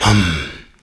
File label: Human Perc, Body Perc